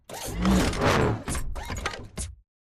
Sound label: melee low sweep right; Titanfall 2